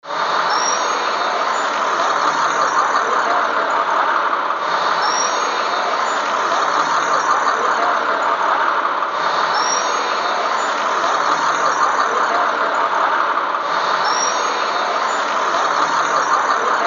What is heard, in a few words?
electric guitar